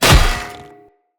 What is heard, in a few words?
Great Sword Impact Armour; Weapon Impacts; Player; Swords; Combat; Founders Great Sword